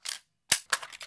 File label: LEVER; KRONDOR